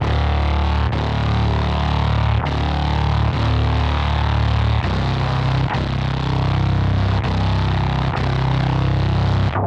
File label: Synth Bass; Bass X